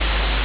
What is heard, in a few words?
ambience room tone